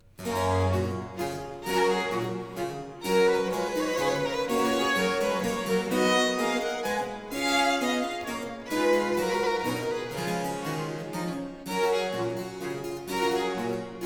Trio Sonata Menuet (Allegro moderato), 2 Violins and Continuo; HANDEL; Trio Sonata for 2 Violins and Continuo in G